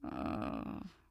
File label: moan, female; Source Engine; video game; npc